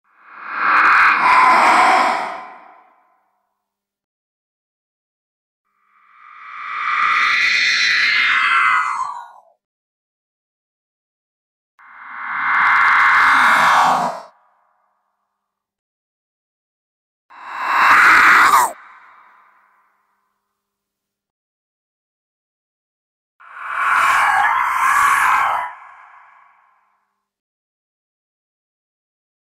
suoni demoniaci effetti sonori horror sounds horror demon